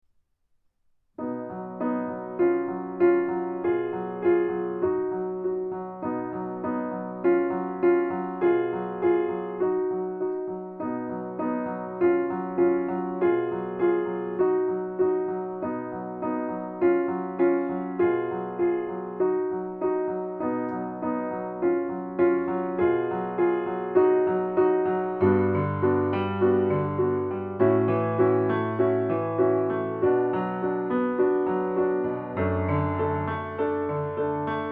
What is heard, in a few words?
piano solo